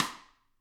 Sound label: GRETSCH SNRR, Drums